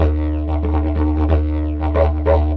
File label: didgeridoo groove; world